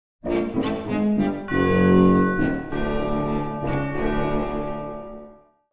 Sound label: Game over you lose horror